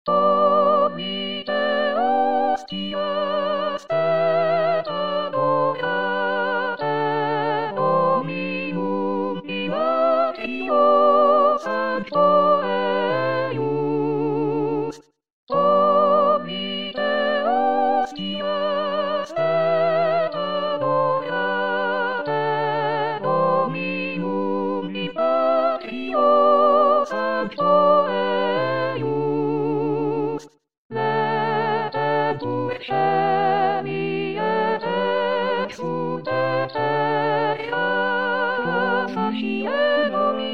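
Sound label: soprano; Tollite hostias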